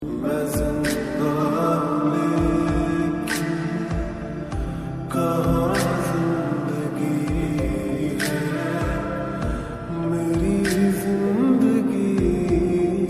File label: Lofi song